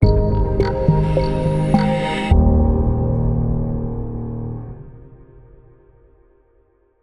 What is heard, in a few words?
Podcast Bumper; sonic